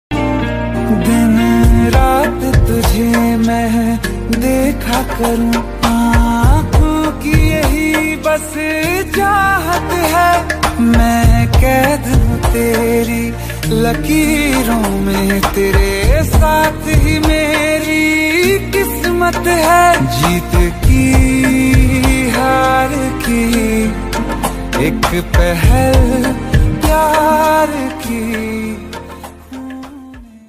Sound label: Love Song